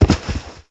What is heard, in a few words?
zombie body fall